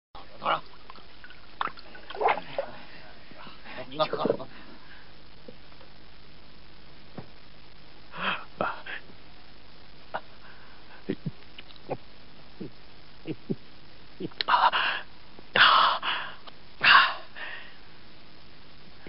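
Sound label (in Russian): человек питьевой воды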